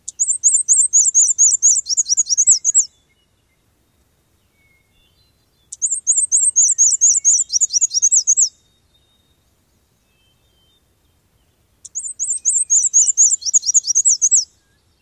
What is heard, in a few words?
complex songs